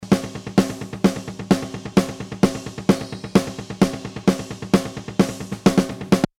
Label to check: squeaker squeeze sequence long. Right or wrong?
Wrong